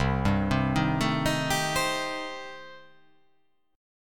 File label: C Minor 6th C